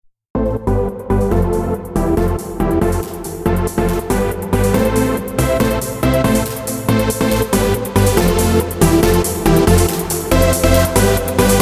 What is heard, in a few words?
marbles; z0noxz